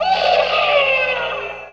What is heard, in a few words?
scream